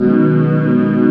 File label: Breathy B6; Digital Sound Factory Xtreme Lead